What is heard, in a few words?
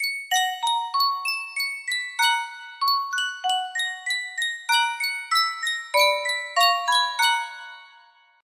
Sankyo Miniature Music Box, music box melody